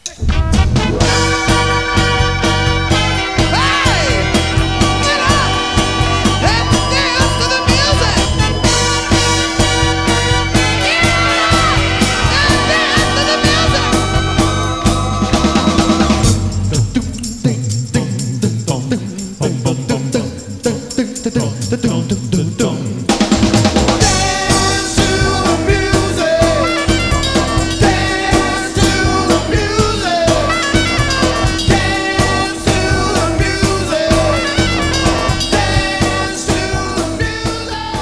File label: dance music